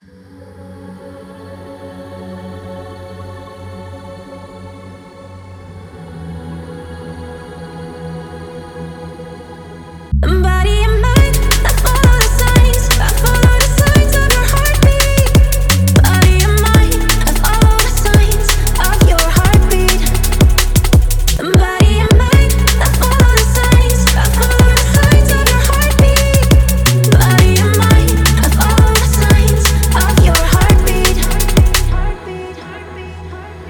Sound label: f minor 174bpm